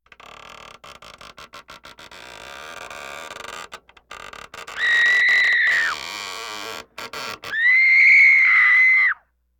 Deur Open, Schreeuwende Vrouw, horror effects; KMP Film Video Sound Effects

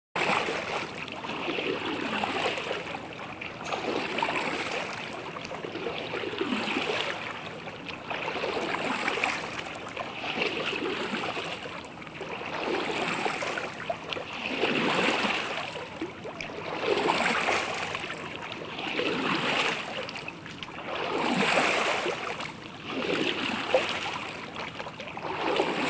Kano Aan Boord; watersport-natuur; KMP Film Video Sound Effects

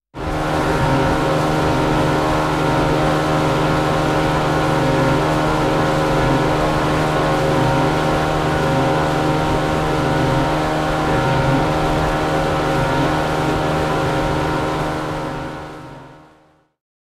Boot Varend; KMP Film Video Sound Effects

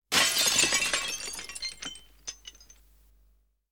Brekend Glas; KMP Film Video Sound Effects

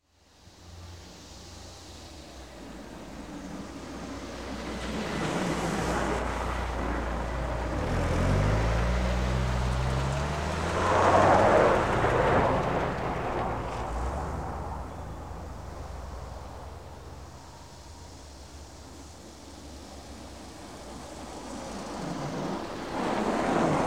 Verkeer - In de sneeuw; KMP Film Video Sound Effects

